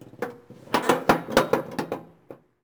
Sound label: Theater Seat Squeak Stand; SFX Kit